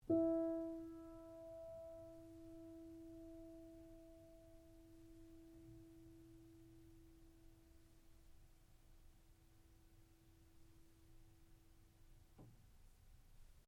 PIANO UNTUNED E3 P, Baby grand piano left to fall out of tune; Pianos (Sound Effects:Musical)